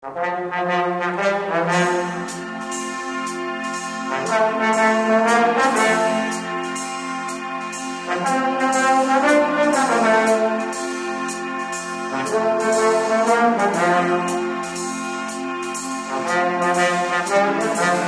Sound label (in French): Instrumental